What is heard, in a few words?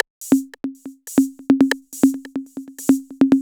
808 Loop, Drums Beats